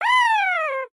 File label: Buzz Death